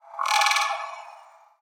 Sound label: Crawler Attack